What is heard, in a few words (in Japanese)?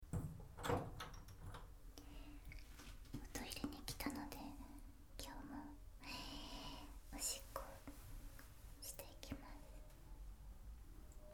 おしっこ, 寝起きおしっこ; 10日目のおしっこ; 寝起きおしっこ11日分